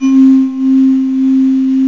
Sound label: bowed pad; gmplay